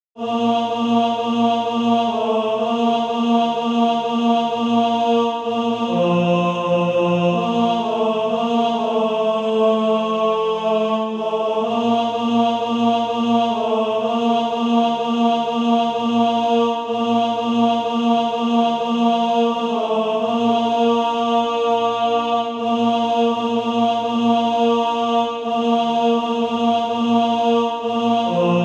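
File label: tenor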